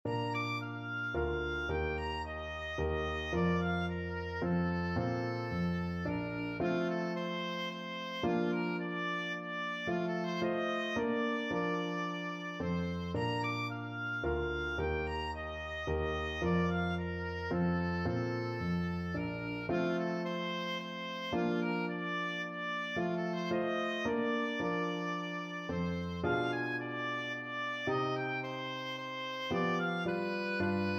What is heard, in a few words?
mozart minuet, oboe